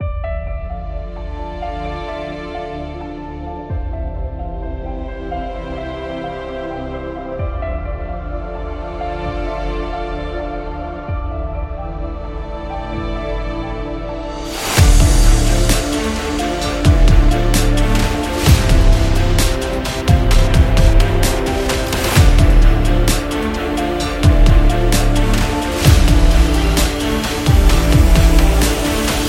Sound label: Cinematic Beat; war action